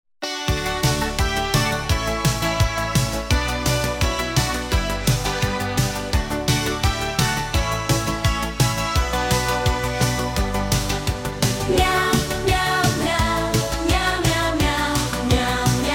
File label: Meow Swedish Pop; MusicBoxAI